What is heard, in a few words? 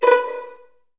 VN FX, Virtuoso Strings